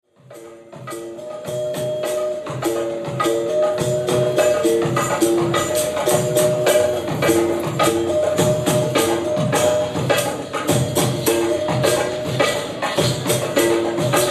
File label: berimbau